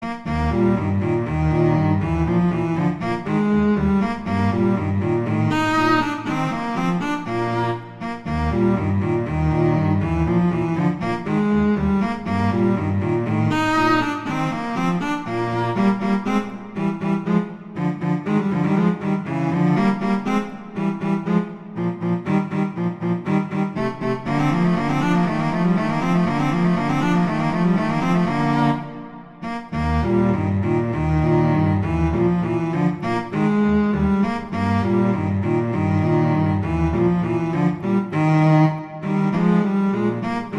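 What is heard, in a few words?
Duet, horn; Mozart; Duets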